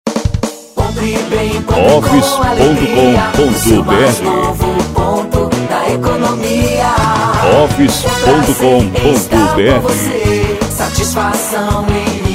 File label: pop rock